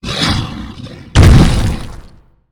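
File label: boss spawn; krasio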